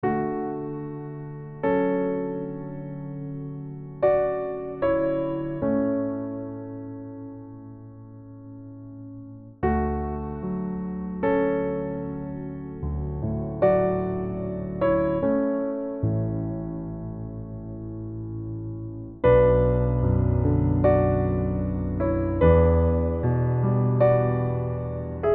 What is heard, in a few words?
lonely emotional piano